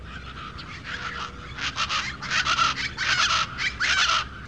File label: chach, plain chachalaca